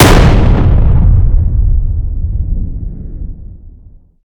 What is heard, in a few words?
explosion close int; gmod; arccw uc commons attachments